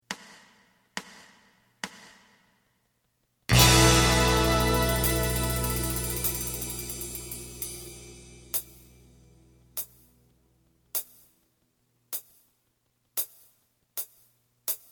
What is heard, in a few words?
haru no umi lead